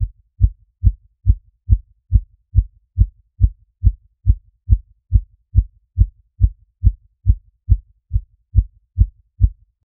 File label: detailed sound, medical